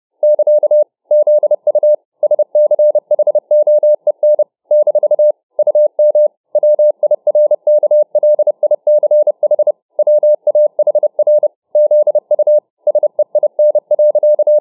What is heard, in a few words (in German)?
Morsetanz 30WpM